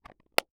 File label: Foley Case Close Plastic Small